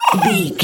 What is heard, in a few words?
goblin fairy hurt pain choke